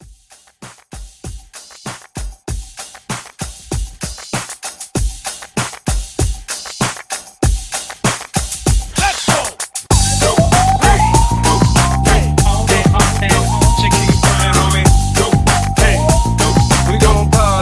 Hype Re Drum

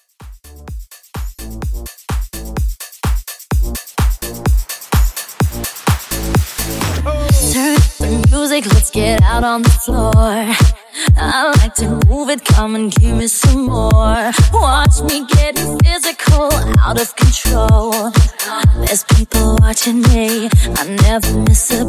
Future House Rework; Remixfreakz

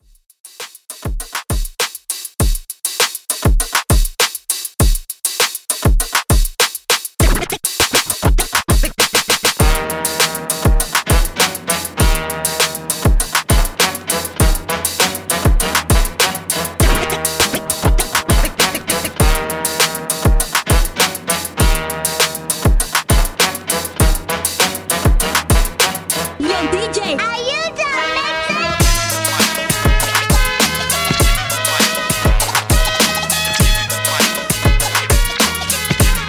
Old School Redrum 100 Bpm

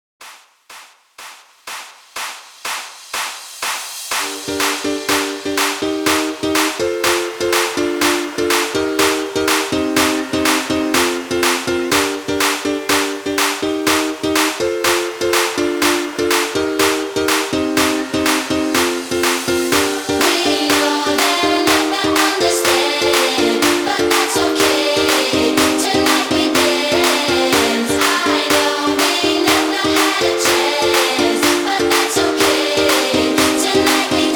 Clapapella Redrum 123 BPM

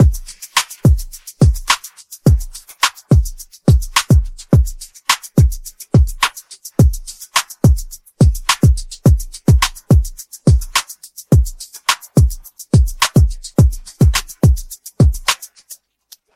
Hip Hop Redrum Intro

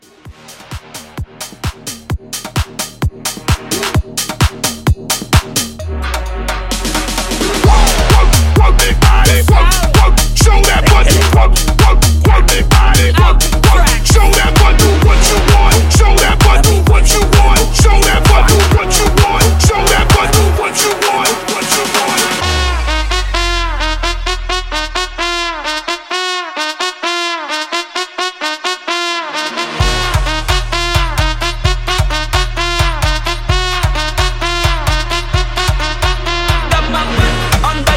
Hype 130

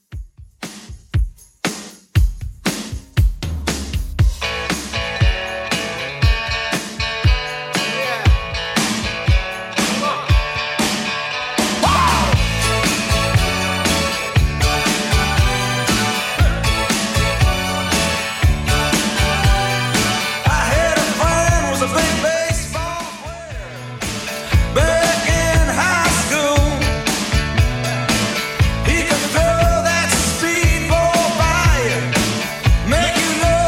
80s Redrum; 118pm

